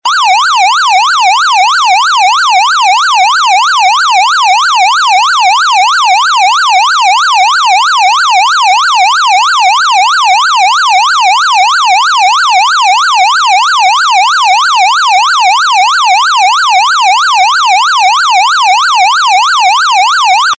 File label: yelp